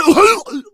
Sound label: male boomer disrupt vomit; voice; Left 4 Dead 2